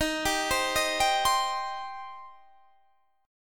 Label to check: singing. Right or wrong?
Wrong